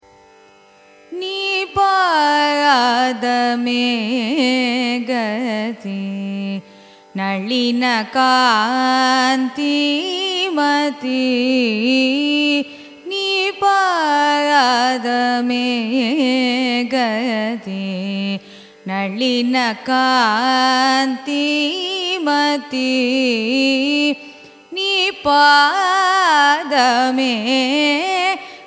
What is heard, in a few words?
raga nalinakanthi carnatic; carnatic music